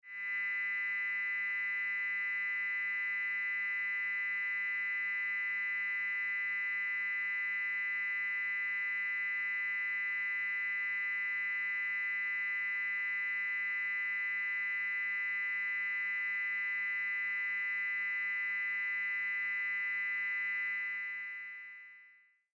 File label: Science Fiction Ballast High Pitch Tone; High Tones; Sound Design